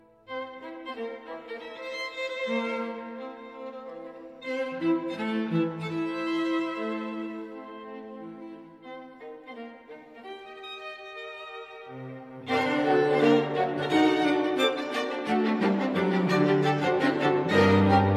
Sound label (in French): Quatuor à cordes en Mi mineur